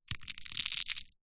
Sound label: egg shell squeeze crack hand; food